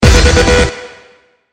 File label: didididii